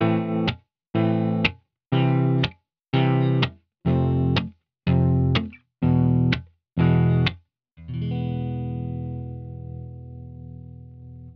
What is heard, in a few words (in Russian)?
Boj s glusheniem chetnyh dolej